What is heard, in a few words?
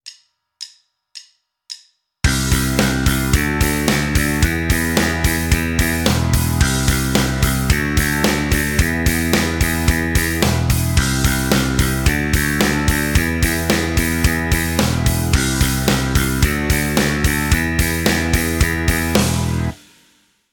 backing; Rockschool; Guitar Techniques 2019 Issue 297 July